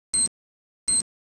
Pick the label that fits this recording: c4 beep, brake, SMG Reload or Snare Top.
c4 beep